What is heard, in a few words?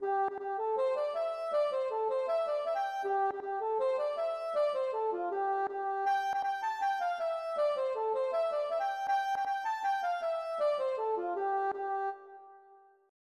polka